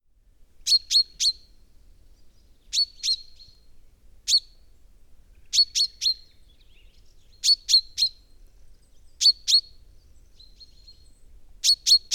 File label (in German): Buchfink; Immenstaedter Horn OA; Johannes Honold